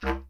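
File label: wind bass clarinet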